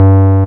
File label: Deep Bass